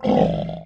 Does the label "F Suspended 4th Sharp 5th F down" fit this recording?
No